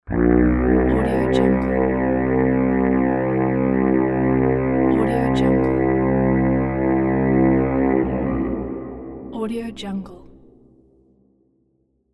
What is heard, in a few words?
battle horn